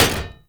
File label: Short Sword Medium Impact Dmg Metal; Weapon Impacts; Player; Swords; Combat